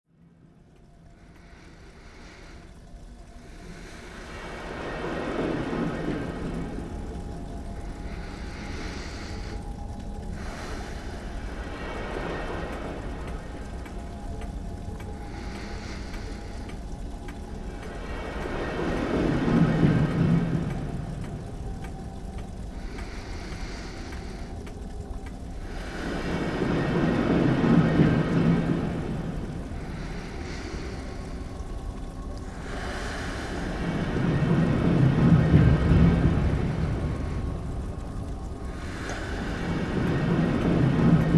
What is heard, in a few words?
breathing